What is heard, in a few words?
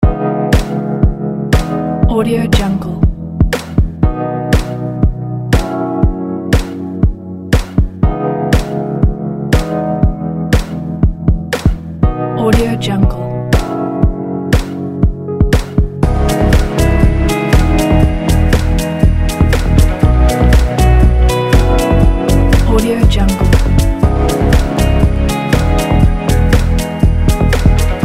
deep pop house